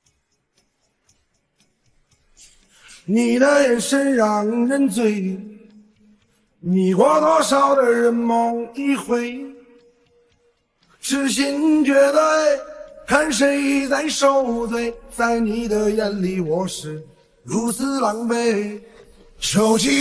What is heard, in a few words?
vocals